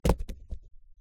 Cardboard Box Hit; hello neighbor pre-alpha alpha 1

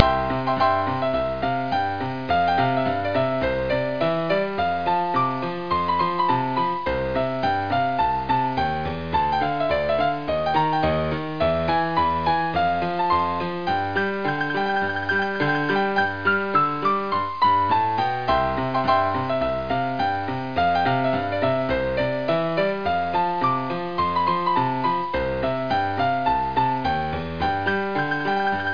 Saloon Ragtime; freddi fish 4 the case of the hogfish rustlers of briny gulch

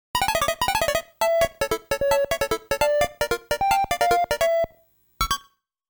Clear BGM; Game Sound Museum Famicom; Donkey Kong 3

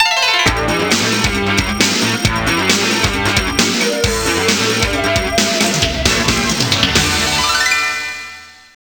Stage Clear Perfect; gals panic 3 arcade 1995

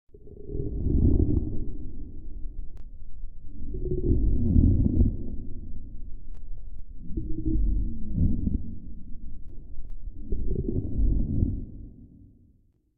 Snoring; Lethal Company

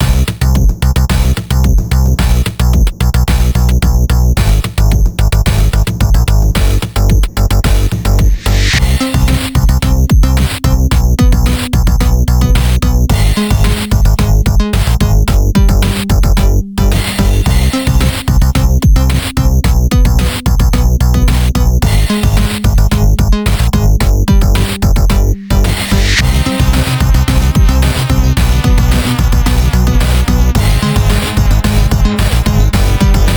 Instrumental; Friday Night Funkin' Hating Simulator School Conflict OST mod Windows gamerip 2022

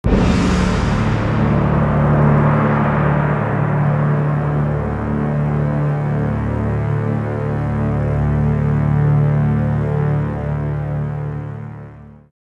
Shot Crash; hulk gc ps2 xbox gamerip 2003; Banner

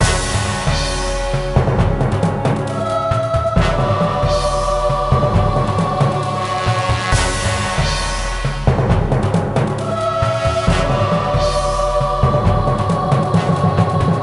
King Battle; Lego Battles 2009 NDS

